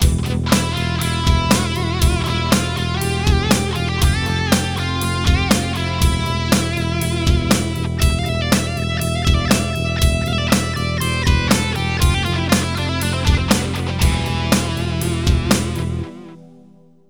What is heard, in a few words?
phrygian